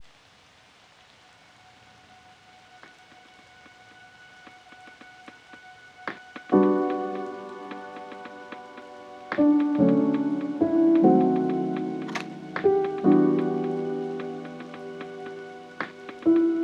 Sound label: lofi hip hop